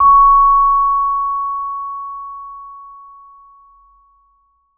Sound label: VMED C#5, vibe med mf; Marimba & Vibes; Sampleheads; Dave Samuels